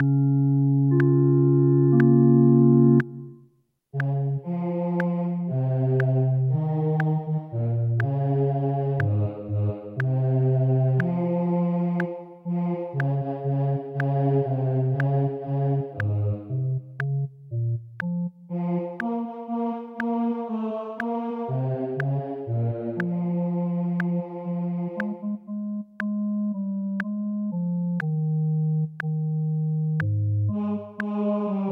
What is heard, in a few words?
O clap your hands; Gibbons